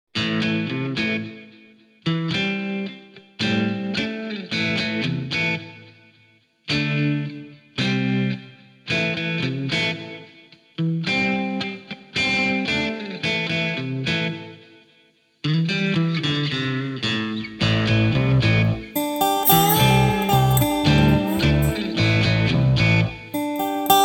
Southern Stomp Underscore, Americana, confident country; Narrative Mind